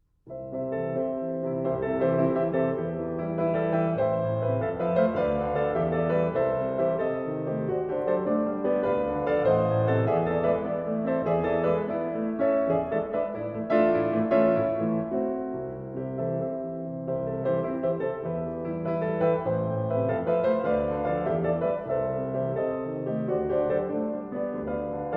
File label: Klavierstucke Intermezzo in C major Grazioso e giocoso